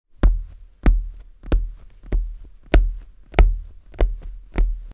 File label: walk loopable